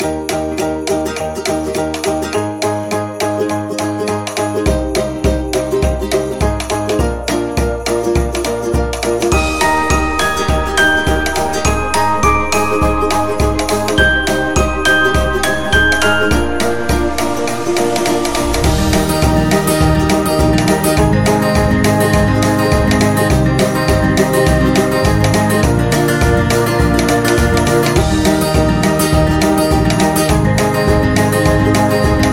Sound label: Christmas Wishes